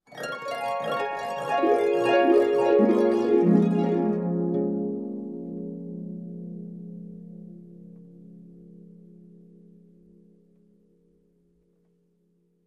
Descending harp dreaming sound effect

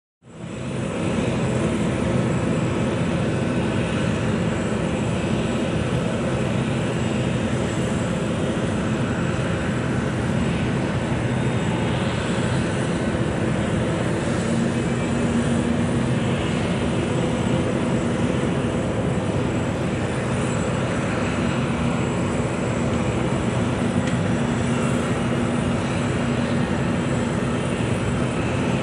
Airliner on standby engine noise

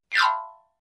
Xylophone fast scale down

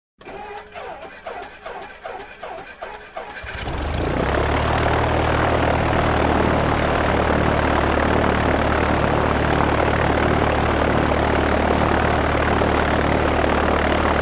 Light Aircraft Engine Hard Start

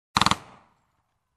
Short machine gun shots

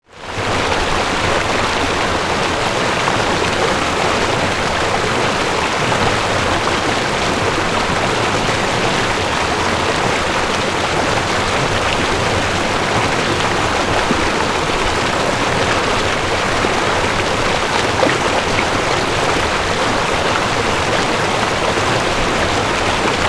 Medium River Flow

